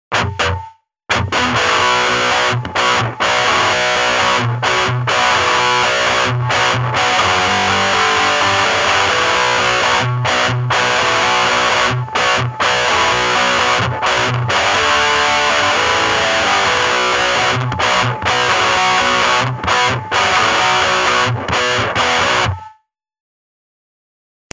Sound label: Small combo lead